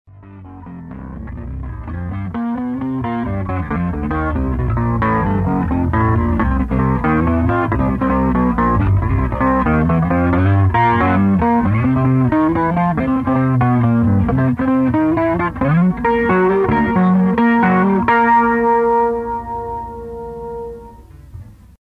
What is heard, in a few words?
10string dean